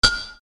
short ambient impact event

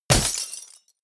break glass small

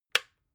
water boiler switch off

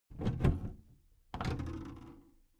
big plastic case lid lift medium

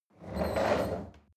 chair wheel roll on floor medium squeak

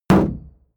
shield sheet impact dent

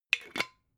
glass bottle massive close cap short

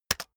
keyboard external space hit very fast

